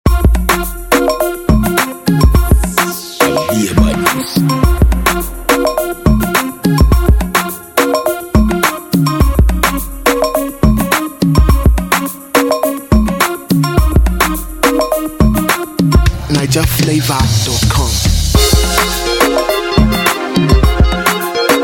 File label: Afro Beat Instrumental; Prod By Dabrain